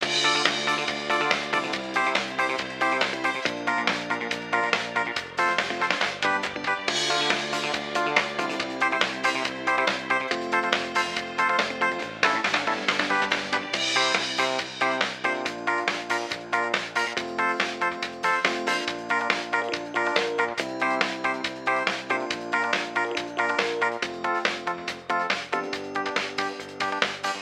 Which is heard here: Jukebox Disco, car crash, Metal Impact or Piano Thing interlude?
Jukebox Disco